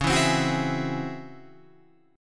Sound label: Db Minor Major 11th Db down Guitar Open F; DbmM11 strummed; JGuitar